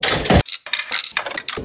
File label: Vending Machine; blue archive